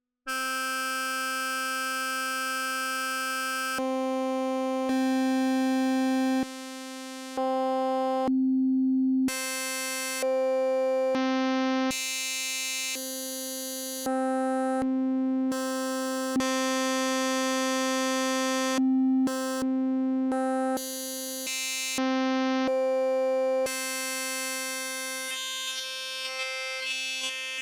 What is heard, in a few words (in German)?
MicroFreak Wavetable Oszillator